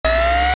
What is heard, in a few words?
zoom in short; Samsung SGH-Z540